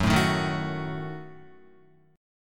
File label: Gb Minor 6th Gb down Guitar; strummed; JGuitar